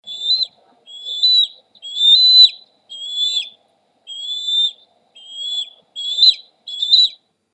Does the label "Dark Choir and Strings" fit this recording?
No